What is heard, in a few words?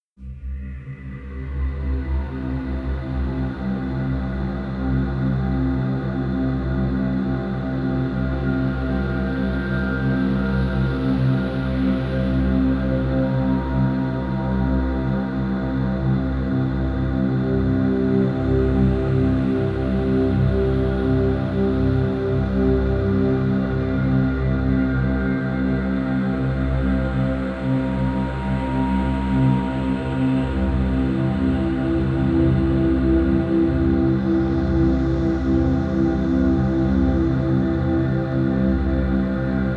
Delta Binaural 432 Hz Meer Binaural Beats; Deep Sleep Music; Entspannungsmusik